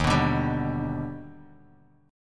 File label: D sharp Augmented Major 7th D sharp, chord; strummed; 11,14,12,12; JGuitar